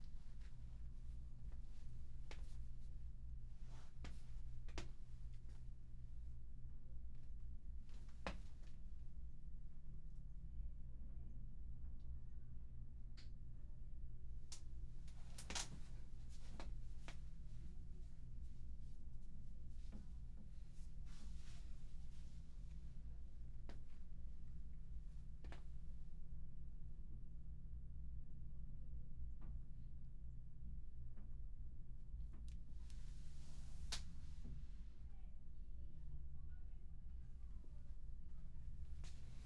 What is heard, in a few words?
Office Chair